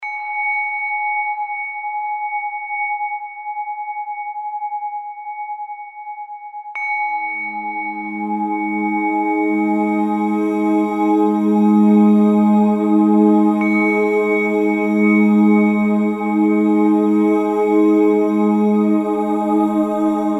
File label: Singing Bowl; AudioSoul